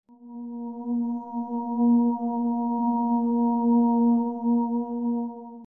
MUSICAL

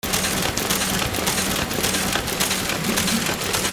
INDUSTRY

